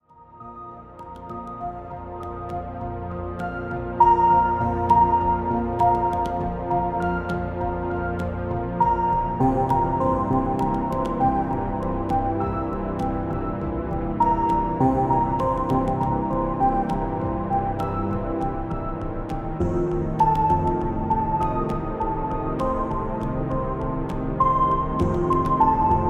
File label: Ambient Soundscapes, Electro Wave